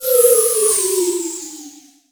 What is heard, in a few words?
Scifi Synth Tone Powerdown; Energy & Forcefields